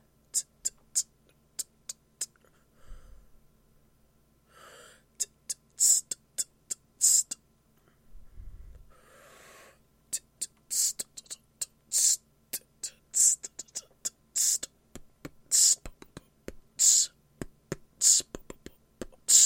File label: beatbox practice